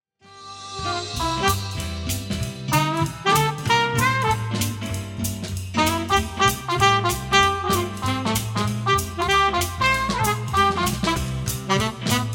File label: alto sax